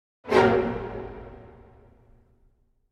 Sting, orchestral Stings

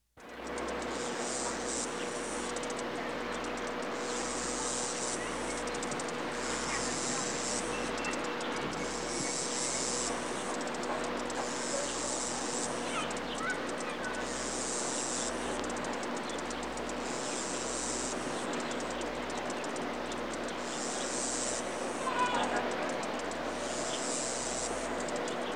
crickets; animals

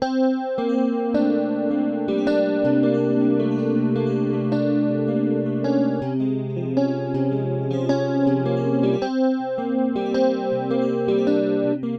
Dreamy Guitar Pattern

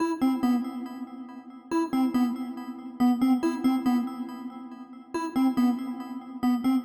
Pensive Tension stabs 140

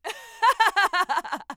Woman Laugh; laughs-chuckles

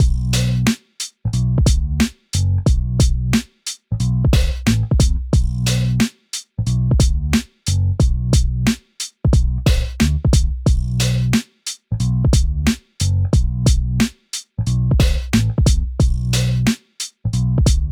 Blues Bass And Drums B 90

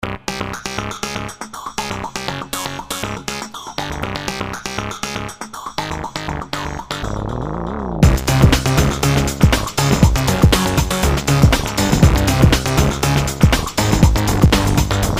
Music background pads

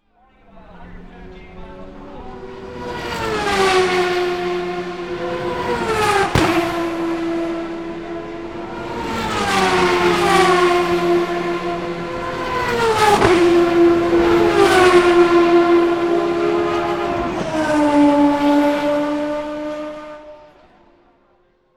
cars

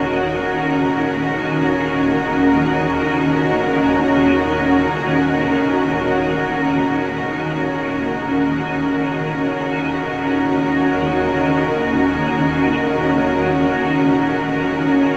100 Ebm7 Ambiance, Ambient String Loops; Luxe; Diffraction

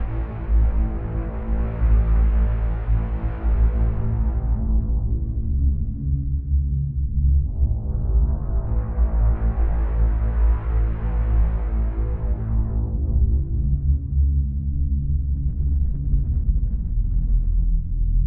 A Bass Grains, Granular Synth Loops; Seaweed; Hydrosphere

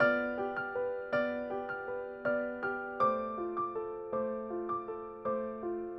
Delicate Piano

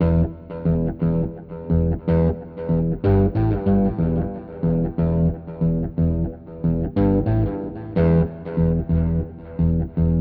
Blues Low Guitar Rhythm Em 60

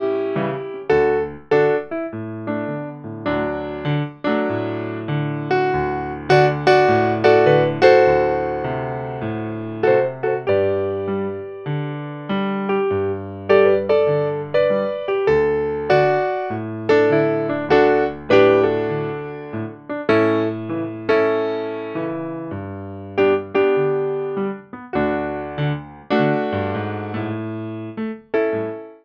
Piano

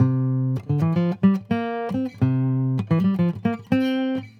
Acoustic Noodling

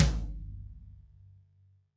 drum floor tom

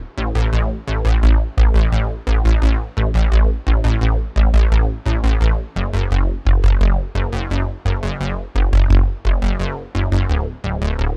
A Bass, Synthwave; Synthwave Loops; Flamingo; Futurism

